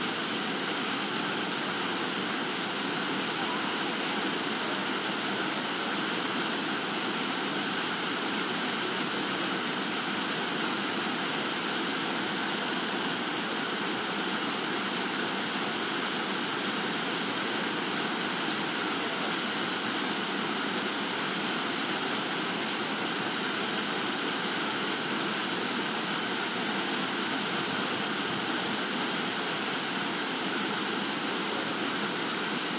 waterfall

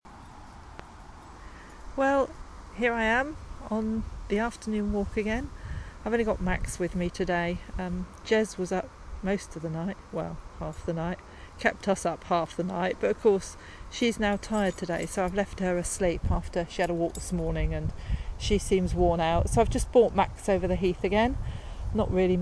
walking boo

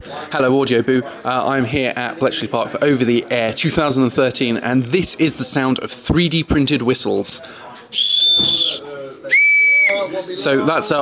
3d printed whistle; at ota13

